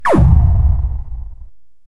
futuristic extremely brutal

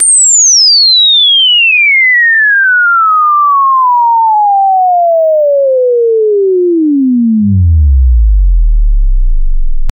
three tone descending chi, chime

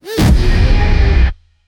arcade fighting game heav

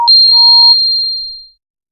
coin increase, game

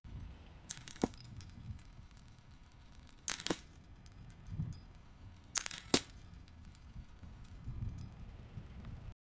sound of anchor chain dro; nautical

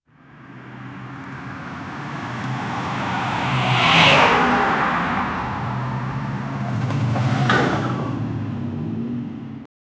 two cars crashing into on

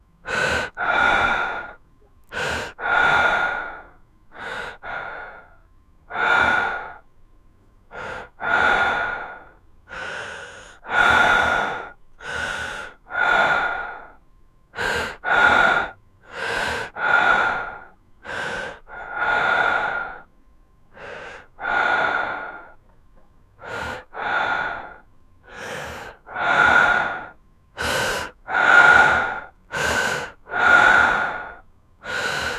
handsome young man breath, pain

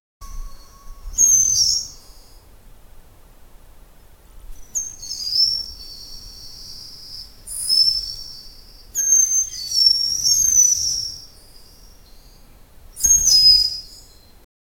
sound of shiba inu doge, dogs

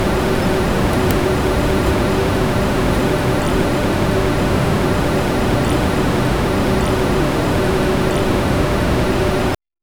textile factory interior

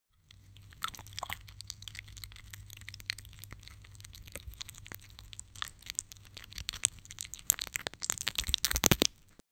very fast crunching

